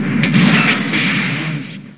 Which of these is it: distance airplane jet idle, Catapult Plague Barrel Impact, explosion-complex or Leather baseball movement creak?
explosion-complex